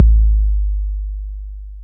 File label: TR-808 Kick, Roland TR-808